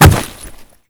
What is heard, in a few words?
jump wood; STALKER; IWP